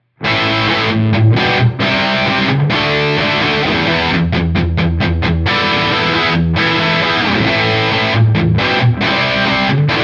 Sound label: Cond 87 on axis Dyn 57 off axis Room Small Studio 2dB